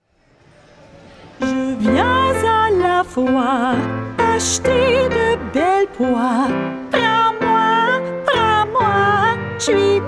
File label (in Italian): Minuetto vocale